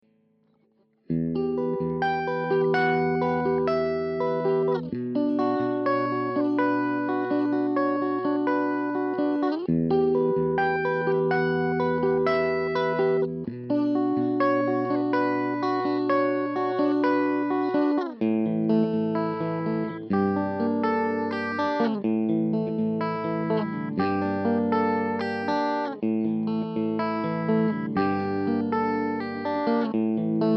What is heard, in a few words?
Rebel Strat